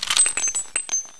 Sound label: 357 reload; weapons; svencoop